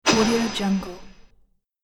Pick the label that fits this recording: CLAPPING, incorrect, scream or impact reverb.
impact reverb